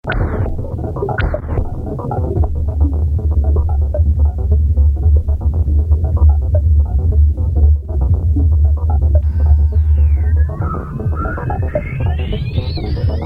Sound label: Drone